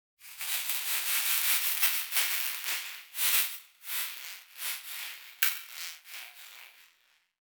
magical fairy dust transforming an object

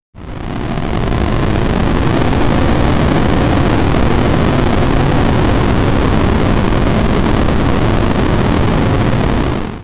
rocket engine sound, 8-bit

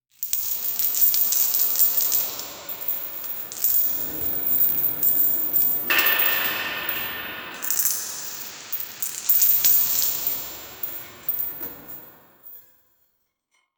the sound of clinking cha, chains

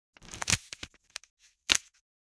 single paper snap photogr, studio

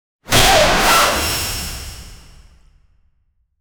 high impact jumpscare for; scifi

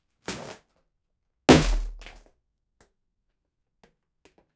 a body falling onto a

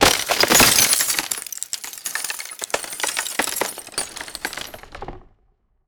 detailed sound, debris

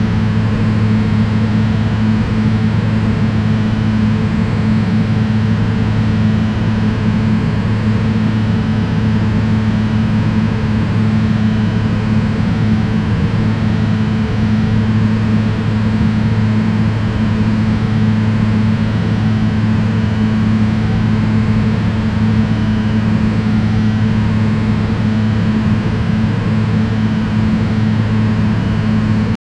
create a low pitch but, calming

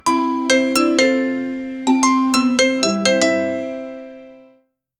cheerful music; bright

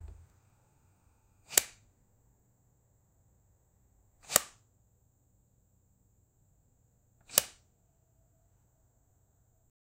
flashlight on

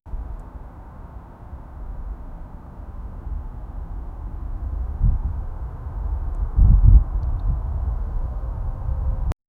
outdoor ambience gentle; 3d audio

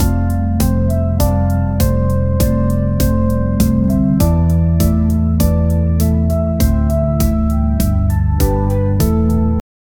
intro music simple sounds

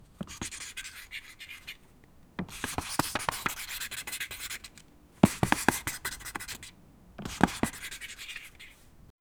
drawing playing cards

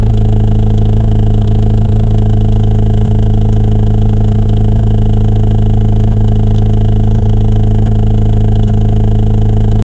flying car engine sound

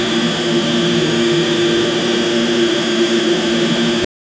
seamless sound loop of th, aircraft

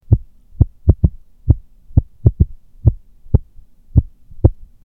heartbeat sound but wrong

